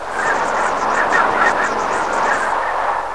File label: dust Devil Spin; POOL CHAMP